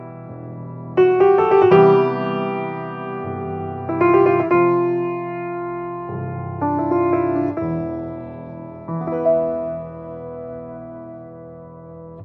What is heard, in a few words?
Piano